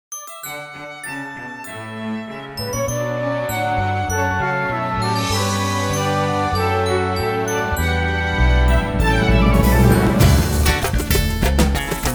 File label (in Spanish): jingle vino navidad